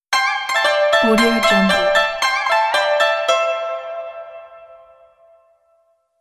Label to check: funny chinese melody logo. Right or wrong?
Right